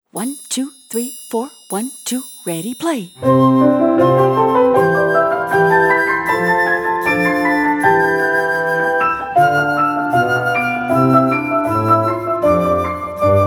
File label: Twinkle Twinkle Little Star